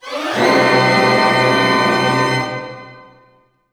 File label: GLISS F# MIN; Sound On Sound - Peter Siedleczek's Orchestra